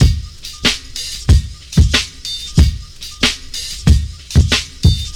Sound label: 93 bpm hip hop breakbeat d sharp key